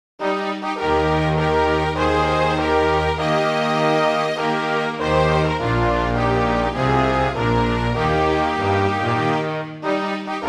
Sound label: ANTHEM Suriname